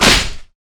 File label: Ranged Whirlwind Hit